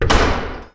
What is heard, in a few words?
Door Bathroom Open; Among Us